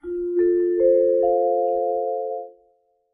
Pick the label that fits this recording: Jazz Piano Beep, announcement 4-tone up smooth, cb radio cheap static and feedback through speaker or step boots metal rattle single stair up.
announcement 4-tone up smooth